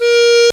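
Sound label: clarinet mid, FAIRLIGHT CMI IIX REEDS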